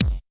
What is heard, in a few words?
heavy impact; gonner linux macos ps4 switch windows xbox one gamerip 2016